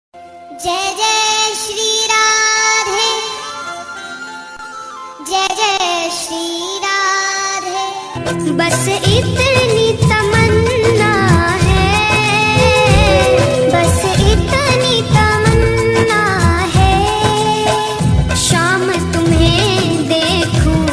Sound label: Bhajan Ringtone; Jai Jai Shri Radhe